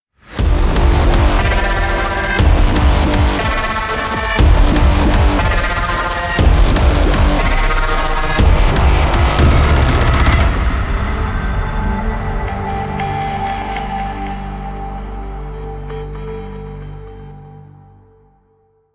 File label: Draw